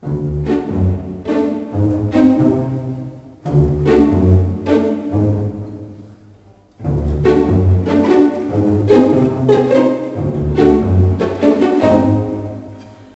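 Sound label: Pizzicato; Bienvenue chez les Ch'tis le jeu; NDS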